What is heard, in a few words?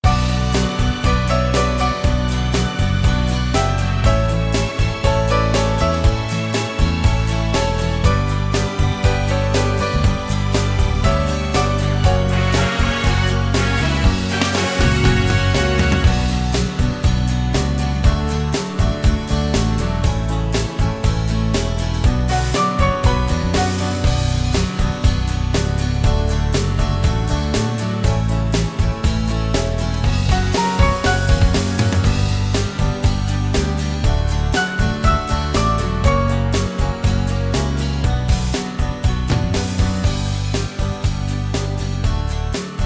SINGBACK, BULGARIAN